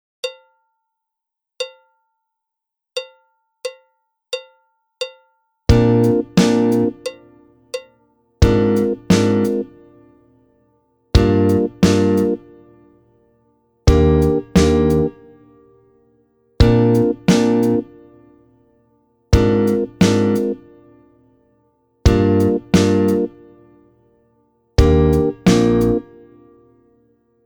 Hallå pulsen, trombon trumpet

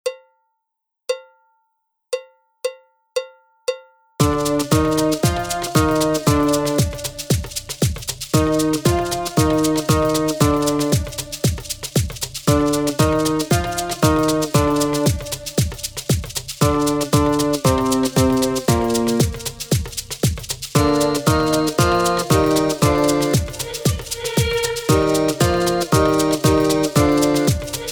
Kråkan flaxar, trombon trumpet

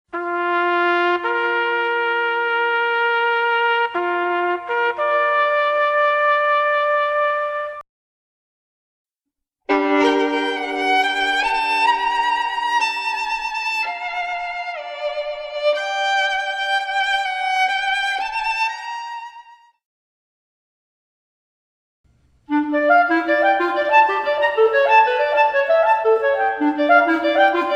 Höra skillnad på stråk och blåsinstrument

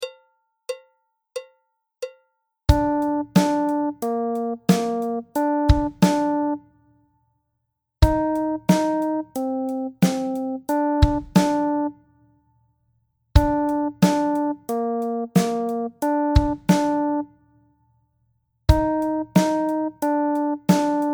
Puls; Nivå 3

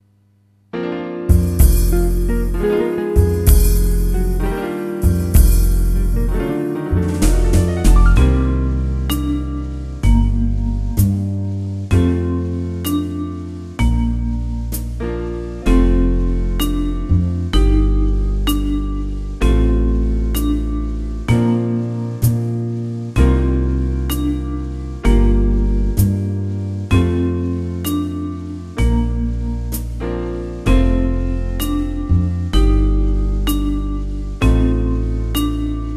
Klockorna, trombon trumpet

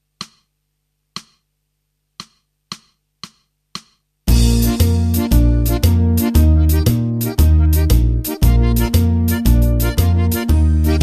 Liraren, trombon trumpet

